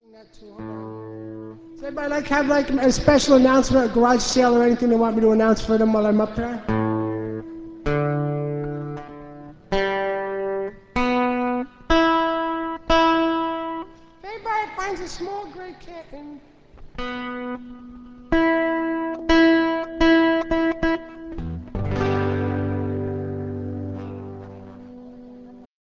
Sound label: tuning up